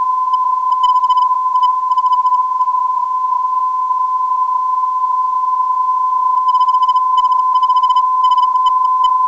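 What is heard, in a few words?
non-directional beacon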